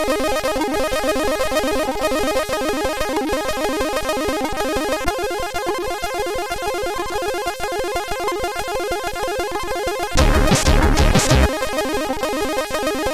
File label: No Name party version, sid, CSG8580R5